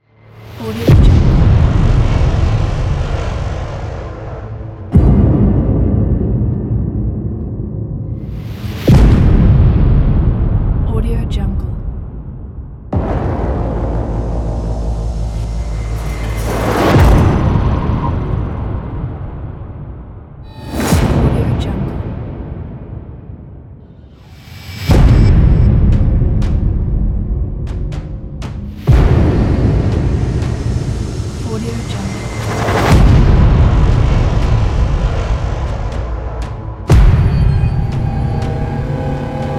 horror teaser

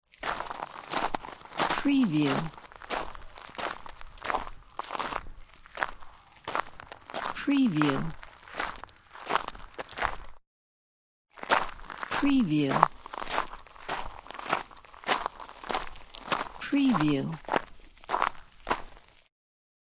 footsteps on gravel

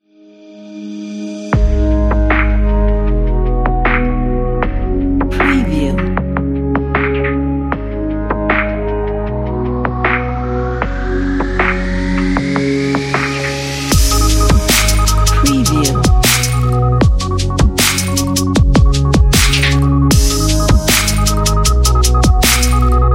stylish idm

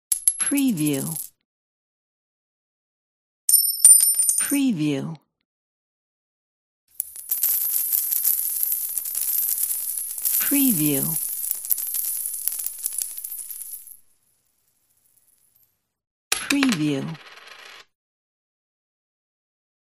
coin sounds

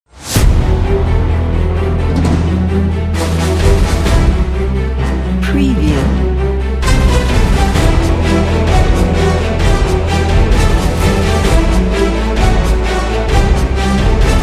epic heroic orchestral trailer

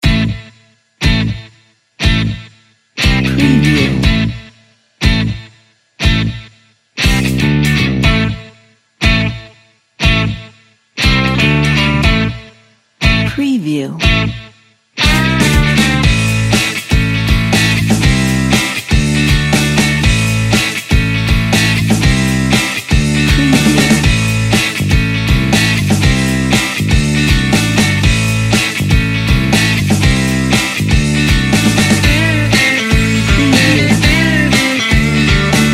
summer happy pop rock

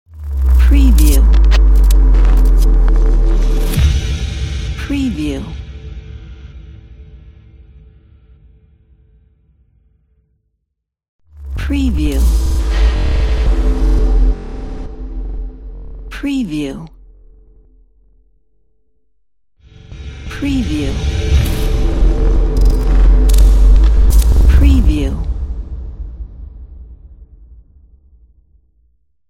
glitch texture logo

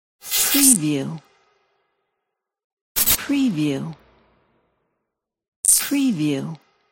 glitch transition for intro

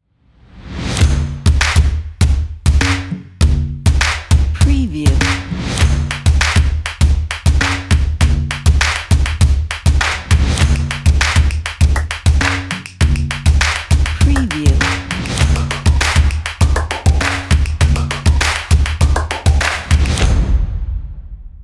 urban stomp and claps energy logo

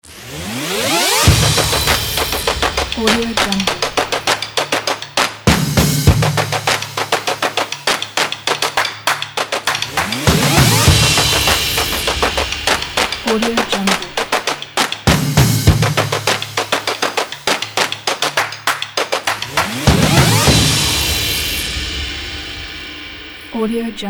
podcast stomp clap intro opener

